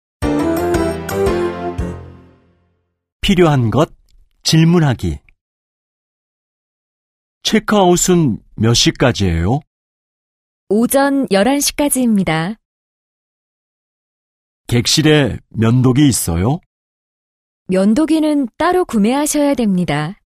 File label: K-POP